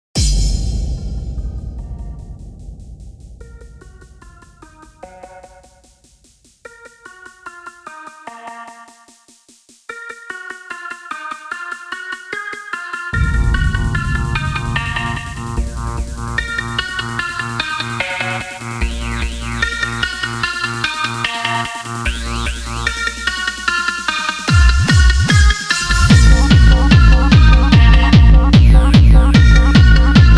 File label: Dancetrax Slice Music